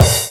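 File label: Boss DR-202 cymbal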